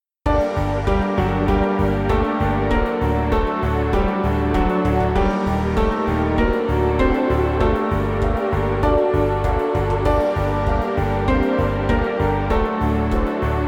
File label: piano